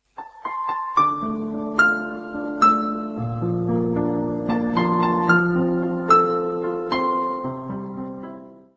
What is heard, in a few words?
Sweet Numb Piano